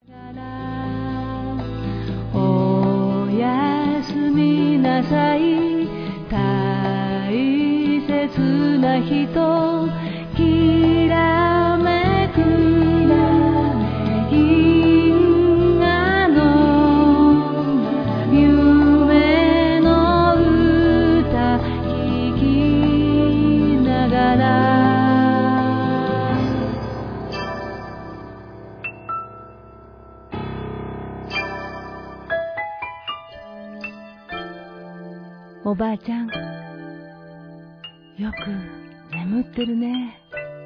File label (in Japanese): komoriuta